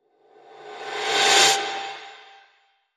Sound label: Shielding Fighter Flyby